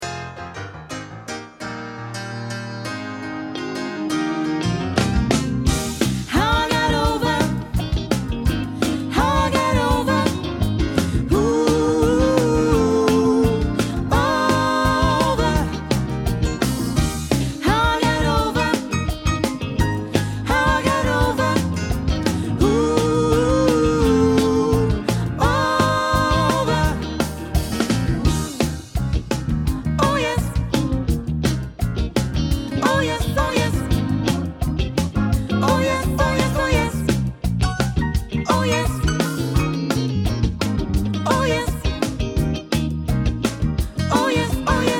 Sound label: Sopr ten